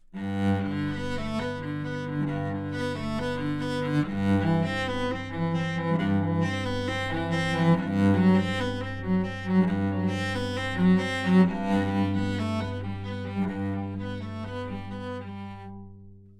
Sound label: cello solo